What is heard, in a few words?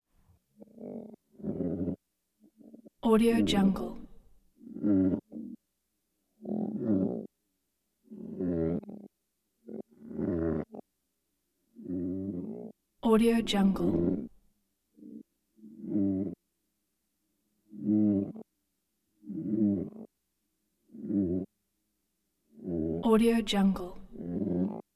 creature voice concerned reaction trapped